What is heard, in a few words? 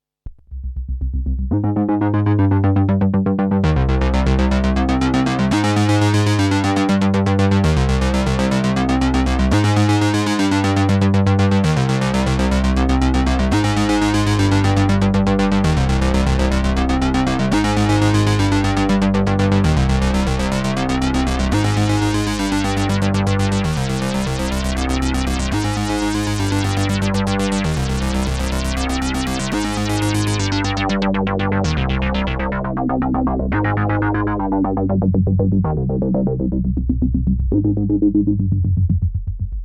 DSI Pro 2 Soft Sync mix